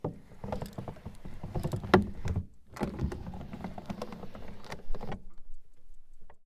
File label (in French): FENETRE OUVERTURE ET FERMETURE